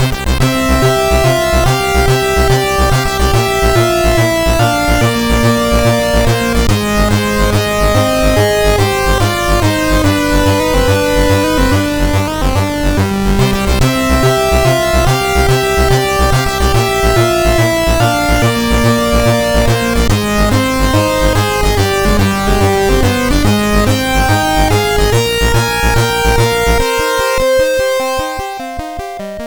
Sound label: Victory, sid MOS6581R4; hvsc